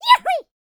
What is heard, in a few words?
shouts; ILIO; Vocal Planet